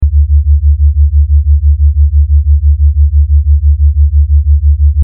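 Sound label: binaural theta 70-76 hz sine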